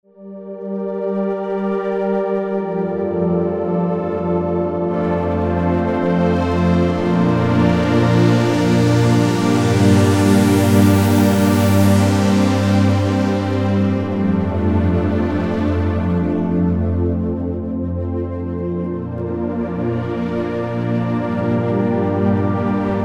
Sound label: Ambient Pad